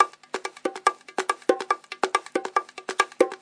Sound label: 070 bongo hi lo